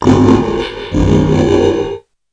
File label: digitsize, Synth